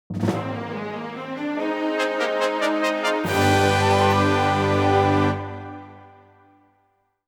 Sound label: defeated fanfare; Armogohma; Legend of Zelda, The : Twilight Princess